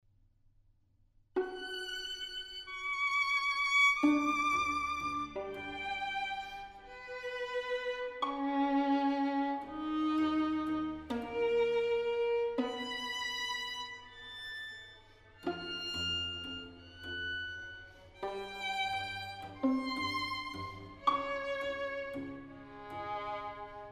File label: String Quartet; Edward Cowie; 'Birdsong Bagatelles'; Black headed Gull